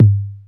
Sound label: boom bass drum g key; kick drum samples